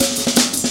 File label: heavy live ride cut, drum loops; Zero-G Jungle Warfare 1, 2 & 3